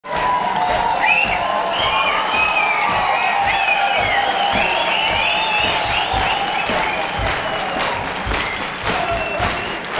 APPLAUSE; Best Service; World Colours

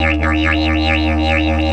EF 140, DIDGE RIFFS

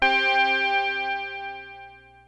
PPG C4, Synthesizer; Hollywood Edge; Giorgio Moroder Rare Synthesizer Collection; PPG.2.3

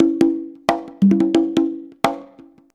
CONGA, Modern Percussion Loops

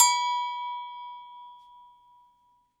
Agogo Bell Low Hit, Latin Percussion; Sonic Foundry (Sony Creative Software); Joe Vitale Latin Percussion

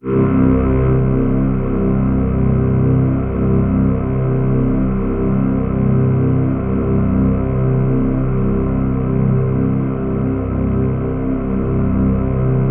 C2, Synth Line; 90 s Sample CDs; NiceBeats; SynthLine VOL-32

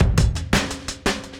Beat Style E 170, breaks; 170bpm

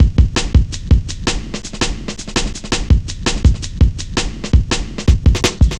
Cut Thru B, Drum Loops, Jungle, 165.5

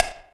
Pop Ambient Pah; Digital Sound Factory Xtreme Lead

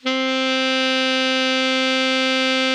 ALTO MF C 3, Sax; Greytsounds; Philip Wolfe David Crozier's Saxophones

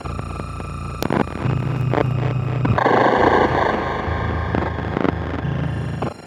Electronic Signals; Sonic Foundry (Sony Creative Software); Loop Noir

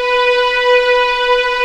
B4 EXP MF, VIOLINS; East West; The Ultimate String Collection

